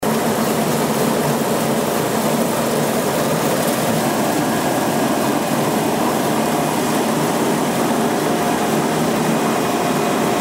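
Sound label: kombain, combine harvester; polesse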